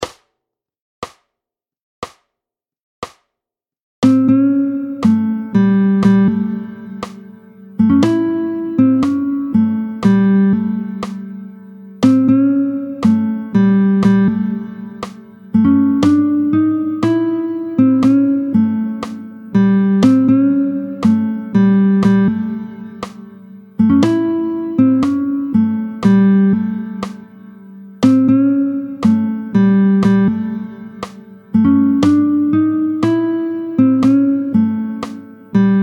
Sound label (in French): Les deux tierces (du blues), tempo 60; Les 2 tierces tempo 60